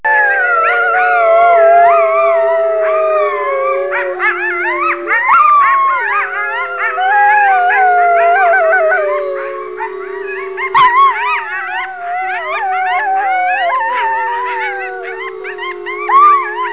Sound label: Chorus howling with coyotes